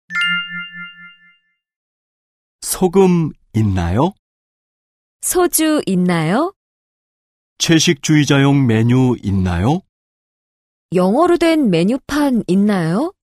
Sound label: K-POP